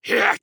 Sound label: Grunt Hit; Realm Royale; Warrior; Lord Of Darkness